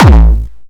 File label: Attack Kick